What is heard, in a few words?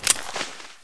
p228 clip, weapons; cstrike